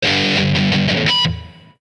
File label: Punk message, Ringtones; Nokia Ringtones; Audio themes